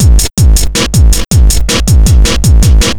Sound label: distortomatic, Drum loops, Electro